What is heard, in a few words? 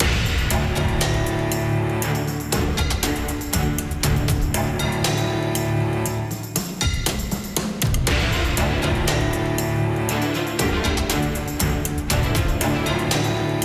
COMBAT forest; Glorious Companions